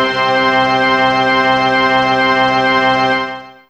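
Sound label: Brass Section C4, Fairlight CMI IIe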